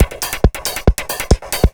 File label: 138, Techno, drum loops